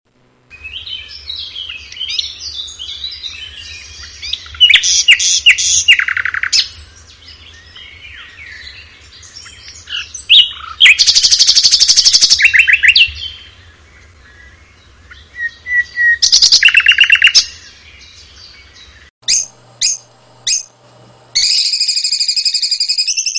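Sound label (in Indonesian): suara burung campuran impor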